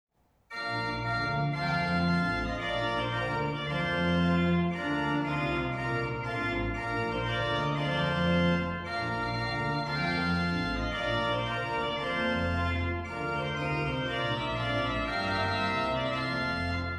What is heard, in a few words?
trumpet